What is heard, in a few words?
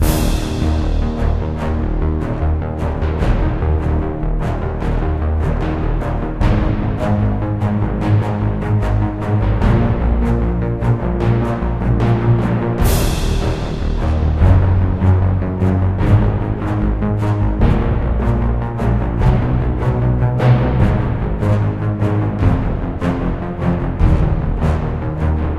Battle Sequence; mass effect 3 citadel gamerip 2013; Arena